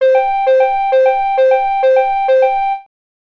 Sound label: etcs warning